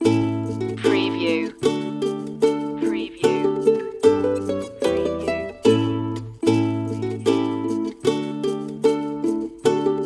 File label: deck the halls with ukulele loop